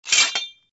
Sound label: rake pickup only; Toontown Online